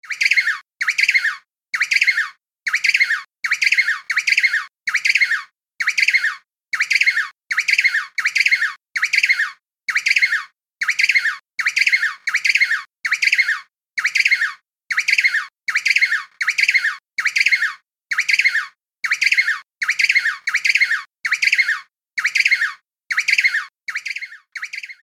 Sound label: Emperor Penguin Baby